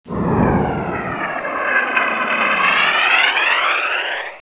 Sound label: flyby; valve; cinima; mayan